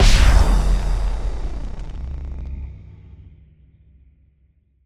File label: Impact Boom Distorted; Bottle Rocket Fx; Impact Collection